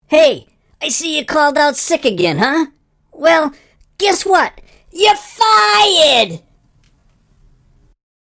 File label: Boss Tone